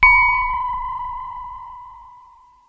analog submarine; Electro and Synthetic

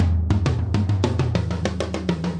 jungle toms, drum loops and breaks, 100 bpm; 091 to 100 bpm

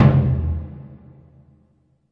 big burundi, African Burundi Drums

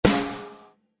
Heavy Snare, DrumKit; REAL LIVE KITS; Osama Qureshi DrumKit

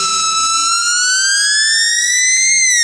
Electro FX; Ueb HE Electro FX

